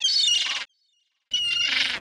Ambient Sea Gull

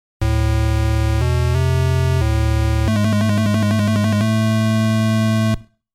Game Start; Game Sound Museum Famicom; Donkey Kong

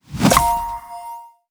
ui collectibles stick

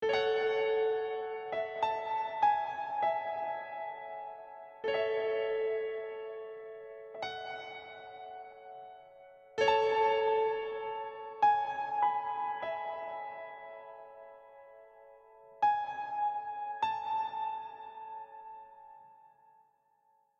Fill

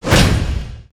UI Menu Select; WWE All Stars; Xbox 360

